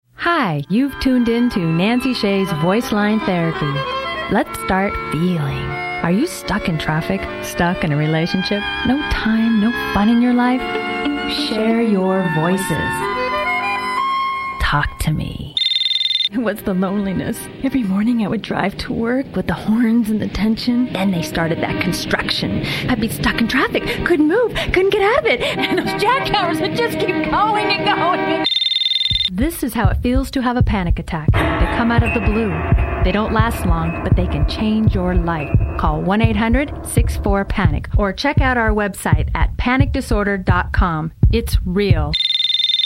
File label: Vocal